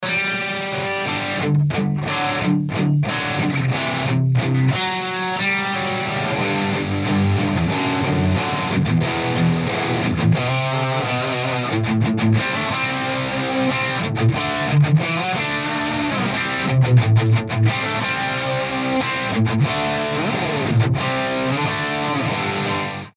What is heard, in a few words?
CREAMBACK; jcm800 4 speakers